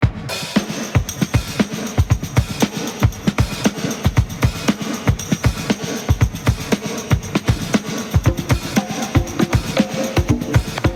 Training Drums; ready or not unofficial soundtrack windows gamerip 2018

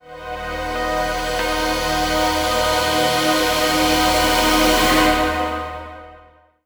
Riser

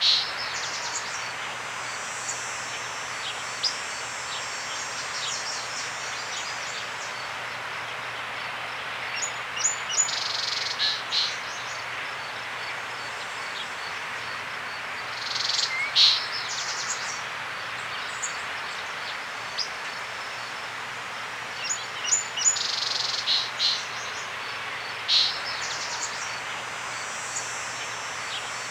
No Crowd; Go Play Circus Star; Wii

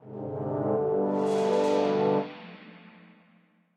Quest Complete; windscape switch windows xbox one gamerip 2016

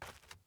dirt step; teardown; ps5 windows xbox series xs

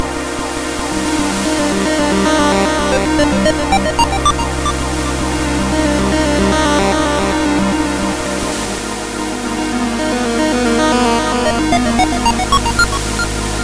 save; Dr. Kobushi's Labyrinthine Laboratory

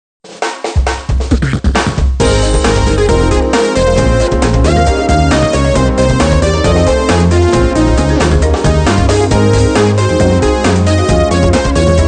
Music Success event

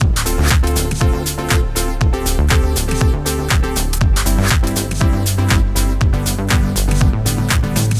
Tutorial Loop; Summer Catchers

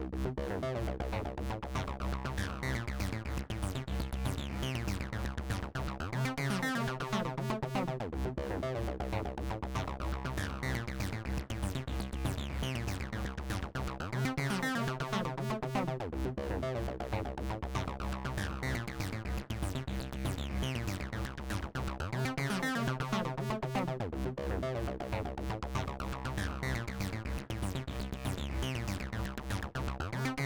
harmony

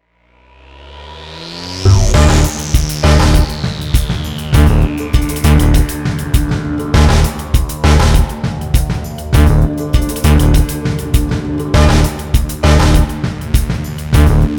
Music Loop; Zombie Army Trilogy

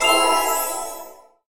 Heal; ChooChoo Heroes

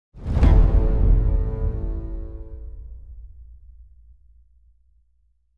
Tension Stop 6-4 100bpm 2bar; project zomboid

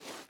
ice jump; teardown; ps5 windows xbox series xs

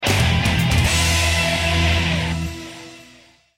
STINGER Mission Complete; Gun Bros 2

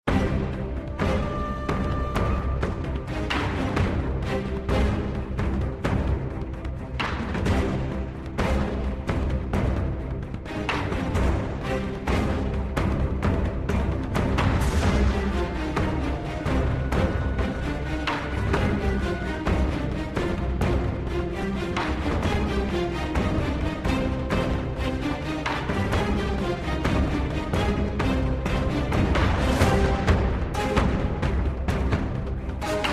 music battle

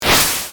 Water Splash; Five Nights at Candy's 3

